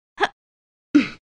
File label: jump female